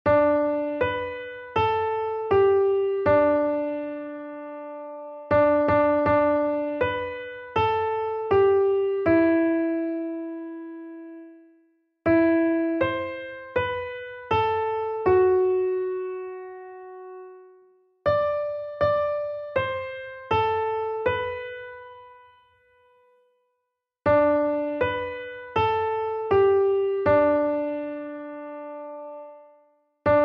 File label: Jingle Bells Solo, flauta doce